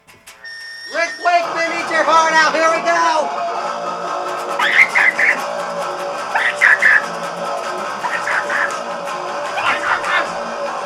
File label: short songs; similar bad noise punk